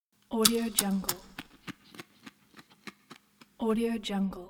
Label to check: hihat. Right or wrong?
Wrong